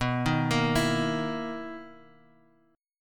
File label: B Major 11th B down Guitar Half Step Down; BM11